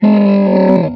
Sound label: GRUNT; Messiah